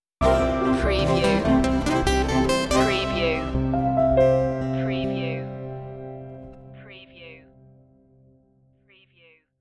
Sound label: hope stinger